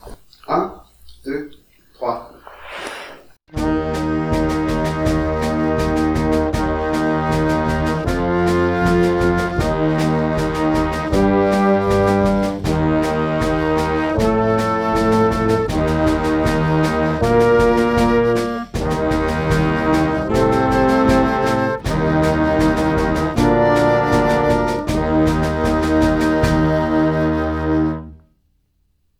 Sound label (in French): numero synthese noire a 80